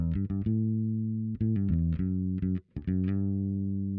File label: bass; musdb18